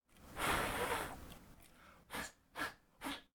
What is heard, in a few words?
Blowing Ash; This Is the Police